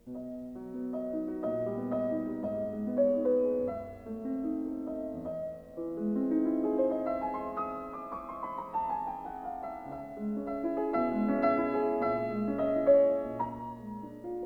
Piano Sonata in B minor, Cantando Espressivo senza slentare, Piano; Classical